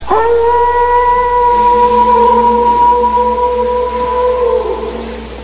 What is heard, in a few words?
howling